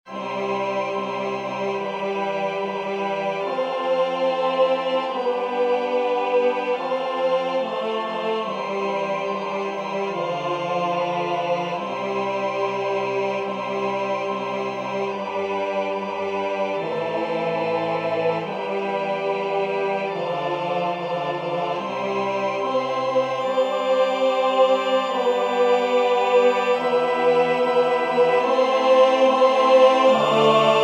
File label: tenor; Adoramus te Christe